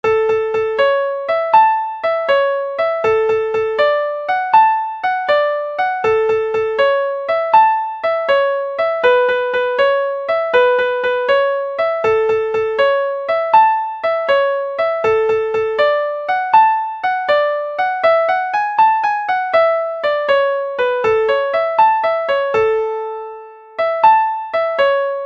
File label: banjo breakdown